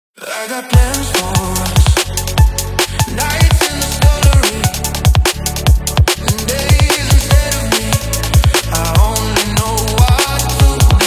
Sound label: HARDSTYLE TRAINER, GYM HARDSTYLE